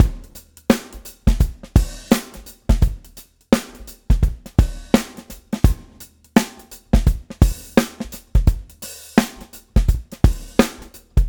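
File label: Swing, Shift; 85-SWNG SHFT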